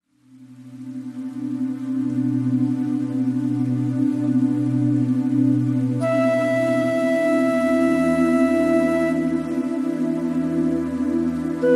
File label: Xiao Zen Waterfall